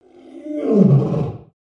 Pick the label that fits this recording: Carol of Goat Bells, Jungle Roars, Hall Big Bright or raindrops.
Jungle Roars